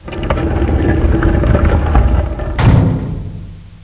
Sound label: door close